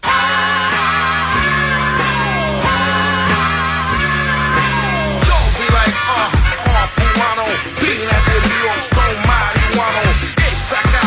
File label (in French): Chanson rap latino